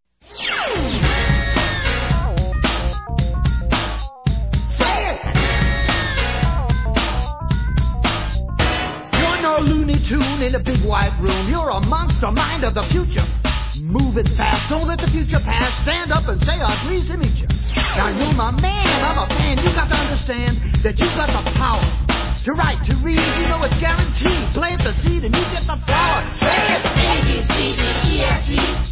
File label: Rap